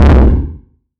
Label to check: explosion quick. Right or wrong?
Right